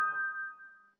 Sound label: success chime, feedback